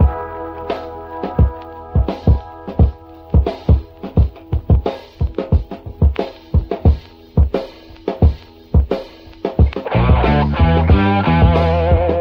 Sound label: full, Loops Phonky